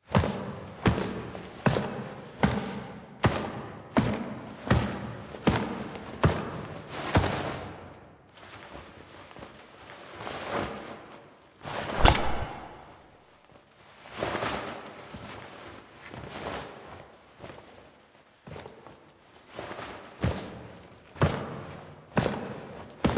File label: Foley A; Drifter; Warframe; Quests; The New War; Lotus Reveal